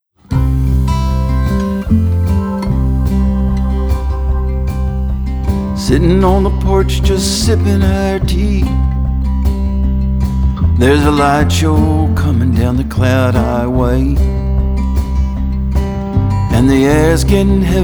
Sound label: Navajo Rain